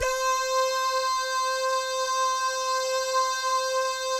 DAH, Vocal Jazz; ILIO

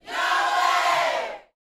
YAHWEH, gospel shouts; Spectrasonics; Vocal Planet

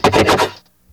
GRUZZ RIF, Stereo Guitars; Hip Hop; E-MU Formula 4000 Series Vol. 1; Hip Hop Nation

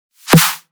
dubstep snare with prething

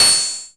EFEX HO, DRUM

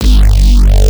G Bass Shot; neuro; BIG5AUDIO

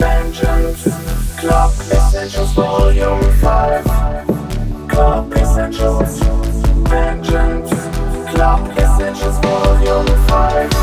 140 BPM Intro MIX, Root E; Vengeance; Essential Clubsounds Vol.5; Demo Constr Kits

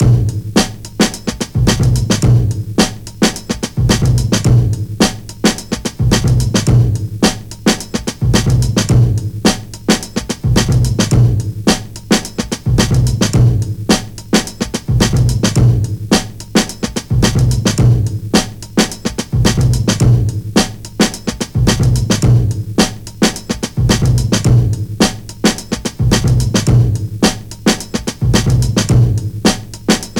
108 Funky Dustbin, Breaks; Lockdown Breaks

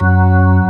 full slow, ToneWheel Organ; E-MU Formula 4000 Series Vol. 3; Analog Odyssey

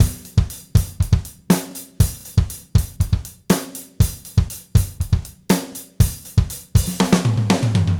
Gretsch groove 90 BPM, 1967 Gretsch Kit drums

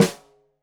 Snare Stereo, Drums; Deeply Sampled Drums

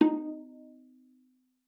viola pizz D#4; Xsample VOL-5

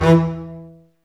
STR, STRINGS; Roland - LA Composer vol. 1

